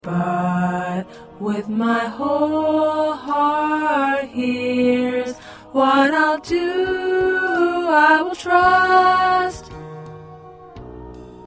Alto, choir

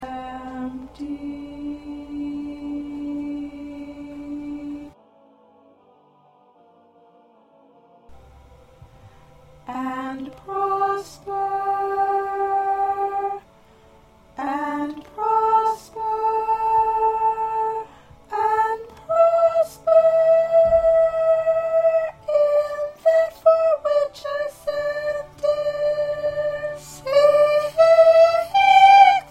Soprano 1

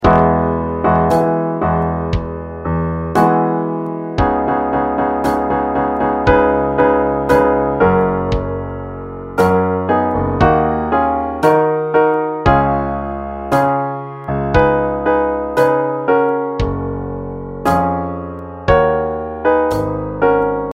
Piano Drum

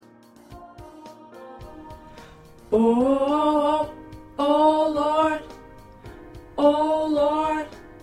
Tenor, choir

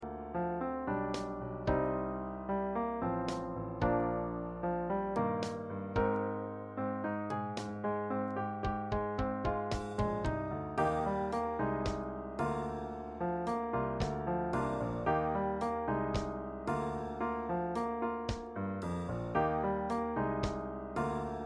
Piano Drum

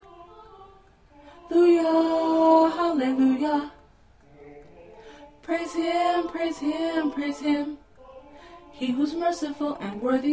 Tenor

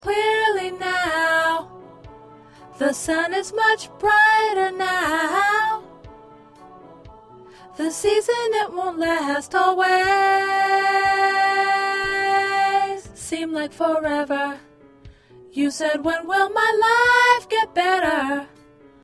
1st Alto

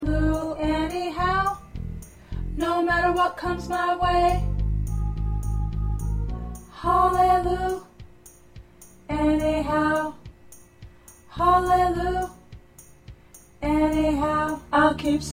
1st Tenor

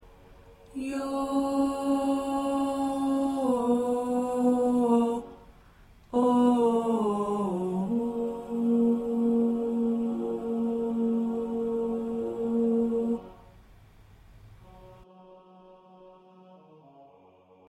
Alto, choir parts

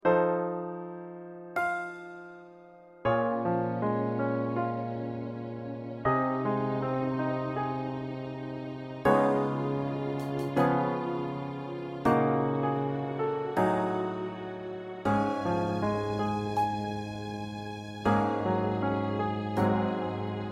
Accompaniment

